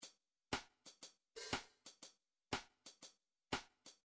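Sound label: midi drum patterns drums jazz swing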